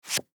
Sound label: Cards Flip Card